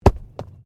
Body movement Organic Impact Medium

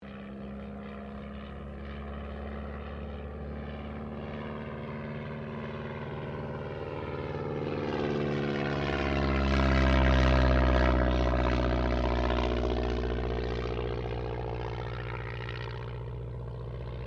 AIRCRAFT DH82A DEHAVILAND TIGERMOTH PASS

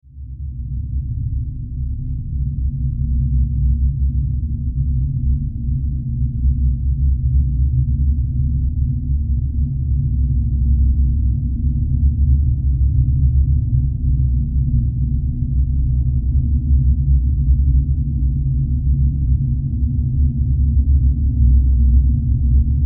Horror Drone Deep Rumble; Evil Sound Effects; Evil (Sound Effects:Horror)

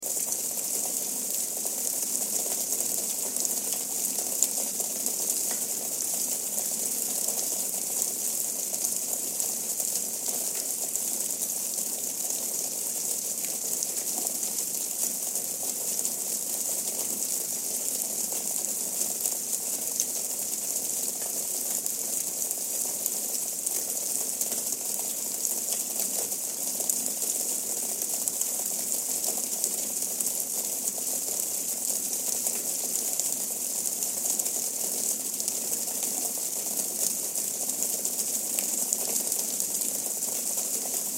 Rain Gutter Water Drips Splatter Loop; Weather; Rain Sound Effects; Blastwave

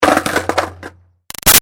Skateboard Drop Crash Bounce; Skateboarding; Sports; Big Room Sound

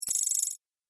Droid Energize, robot sound effects; Sci-Fi Robot Sfx; Andrew Fleming

